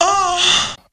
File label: female orgasm